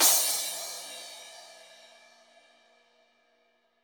CYM; Bob Clearmountain Drums I

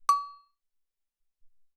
Agogo, Alesis SR-18

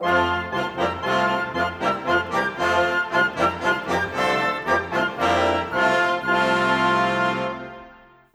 Brass Winds, Orchestral; Sonic Foundry (Sony Creative Software); Orchestral VOL-2; Modern 31

